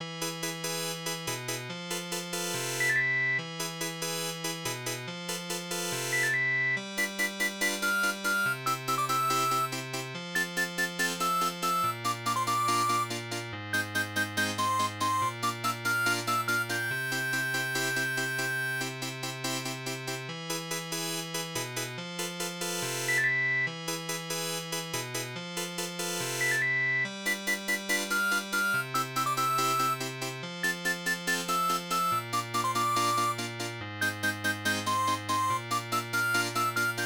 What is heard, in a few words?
[OPN FM MIDI] woods